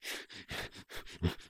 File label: Run Grunts; SyrinScape; City gone mad